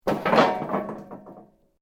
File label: metal rods move